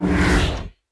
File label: inspect; cstrike; valorant; reaver vandal